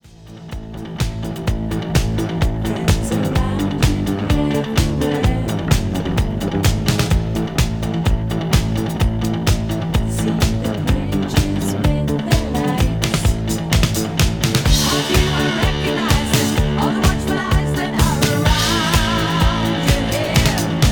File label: instrumental